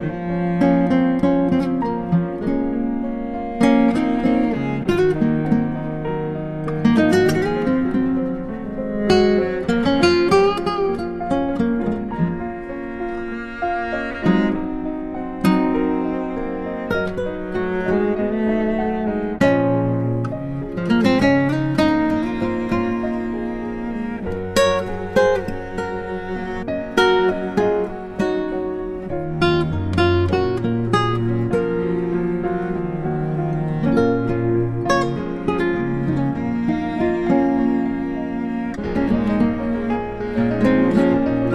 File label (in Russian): Fortepiannaya Muzyka